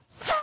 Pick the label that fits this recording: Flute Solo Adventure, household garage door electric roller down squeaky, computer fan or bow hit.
bow hit